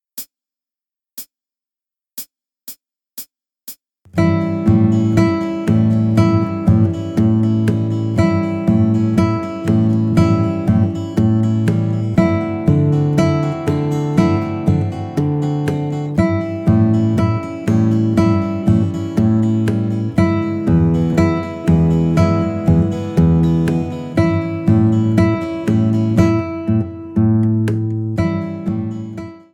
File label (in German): blues fuer die e saite